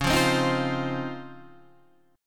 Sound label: C sharp Major 9th, Guitar, DADGAD